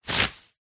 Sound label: tree branch thick healthy leaves impact slap against surface; foley; zapsplat